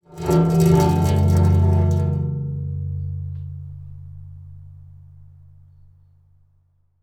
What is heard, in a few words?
Pane Of Glass Scrape